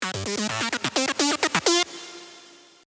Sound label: SYLENTH1